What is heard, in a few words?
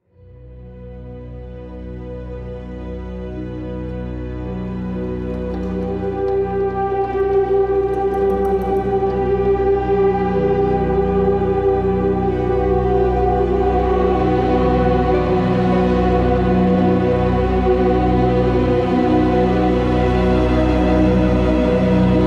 haunted intro; horror intro